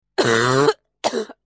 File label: cough; Cosmic Rage